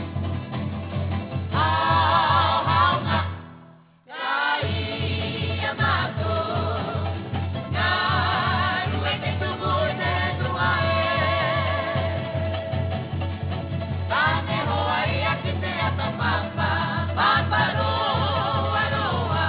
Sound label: music